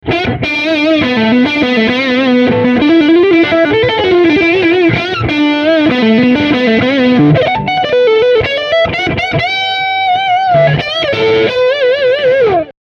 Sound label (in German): uad marshall plexi plugin crash chords dyn. mikro